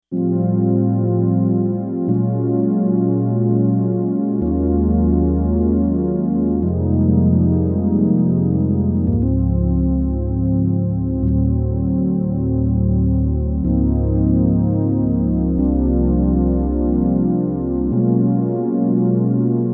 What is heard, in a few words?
Background Pad